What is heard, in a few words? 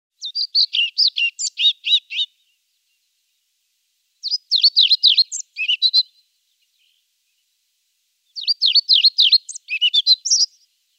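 muholovka pestrushka golos, pied flycatcher voice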